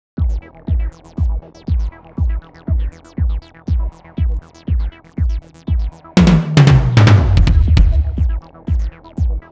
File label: drums; wiesbaden